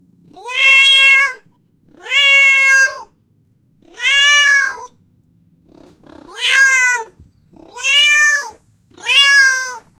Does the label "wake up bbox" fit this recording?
No